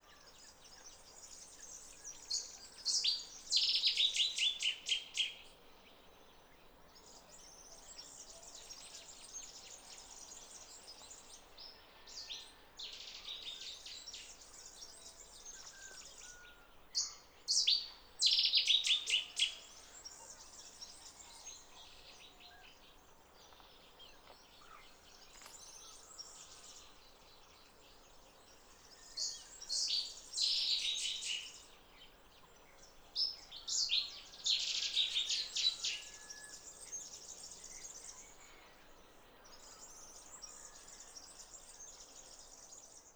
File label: song